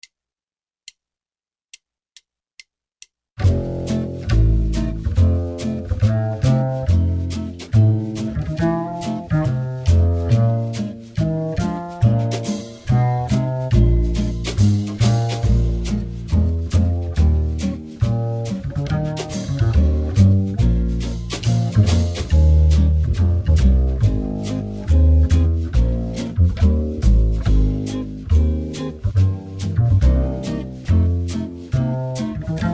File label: Piano Audio